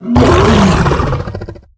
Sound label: Salhets Prideleader, Play